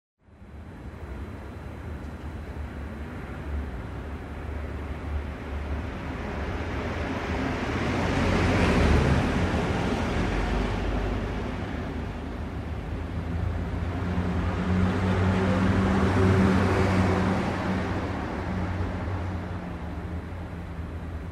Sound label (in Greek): Mesa sygoinwnias Boyhto Dromoy